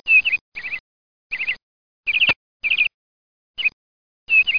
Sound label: MEDOLARK; 2000 Sound Effects